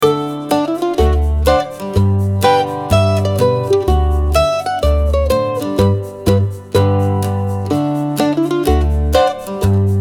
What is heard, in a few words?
Classical